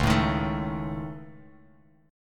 C sharp Suspended 2nd Sharp 5th C sharp Guitar Open F; C#sus2#5; strummed; JGuitar